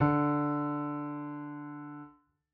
piano d; Golden Crayon Sounds

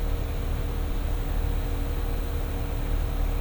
air conditioner outside; Golden Crayon Sounds

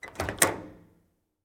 door open; Golden Crayon Sounds

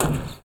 roof step; Golden Crayon Sounds

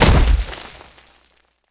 cement fall; Golden Crayon Sounds

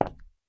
tile step; Golden Crayon Sounds

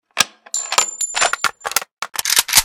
sniper reload; Original TK Sounds

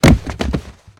wood fall; Golden Crayon Sounds

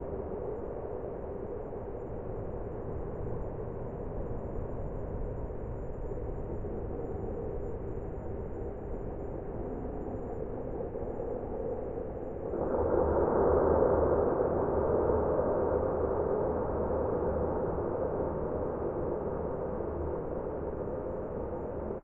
mine; Golden Crayon Sounds

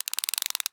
locker security booster; Original TK Sounds

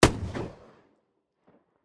sniper dist; Original TK Sounds

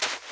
sand step; Golden Crayon Sounds

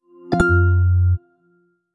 iPhone Air, iPhone Boot Chimes

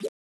Container Touch; business scheme beta 2

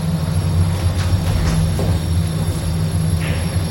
elevator loop; Golden Crayon Sounds

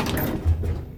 door close; Golden Crayon Sounds

